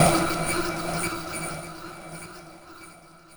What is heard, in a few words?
E Synth